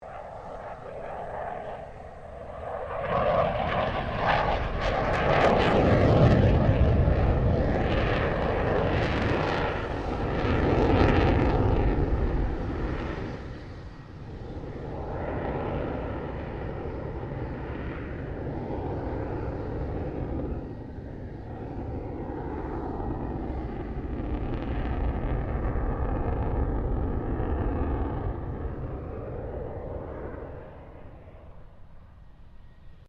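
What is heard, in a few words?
FA-18 Hornet maneuvering; RAAF FA-18 Hornet; Airplanes; Vehicles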